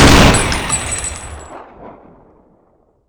minigun fire; weapons

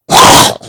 pain, mummy

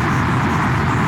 fire loop idle, flamethrower; weapons

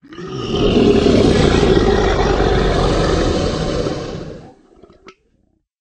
die, creature; stalker

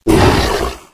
hit, creature; stalker

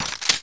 elite right clip in

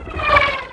bullet L to R slomo, near miss; weapons

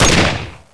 fire, weapons; alyxgun

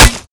hammer strike

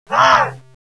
attack

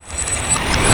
minigun spinup; weapons

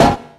epic metal hard

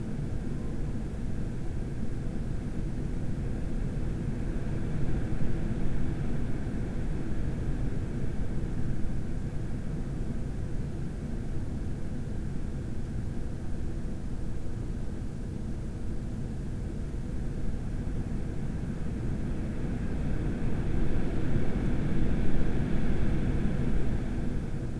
construct tone

